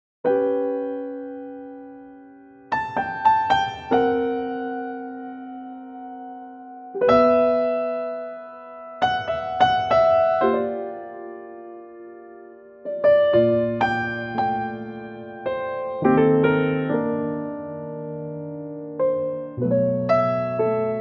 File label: G piano